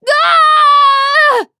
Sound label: Falling; Flak